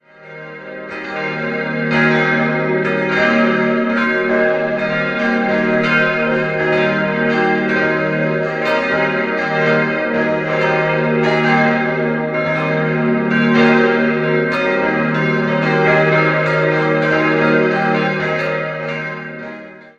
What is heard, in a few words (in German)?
Cranzahl, Glocken